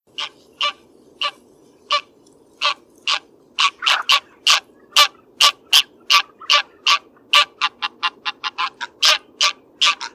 oca selvatica, birdsound

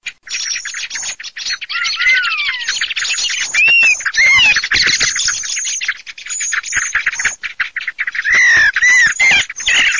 MERLO SCHIAMAZZO, birdsound

